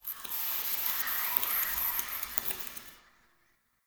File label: Static; Cinematics; Zariman; Table For Two; Drifter